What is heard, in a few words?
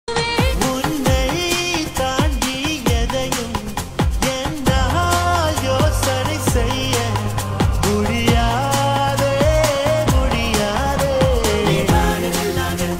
trending music tamil